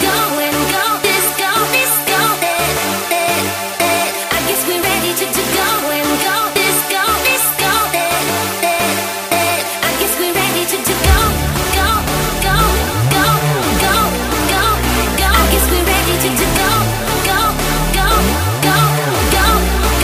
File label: Guided Rhythm